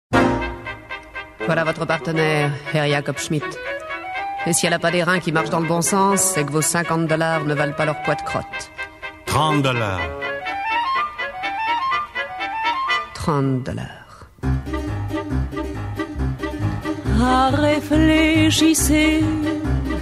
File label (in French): chant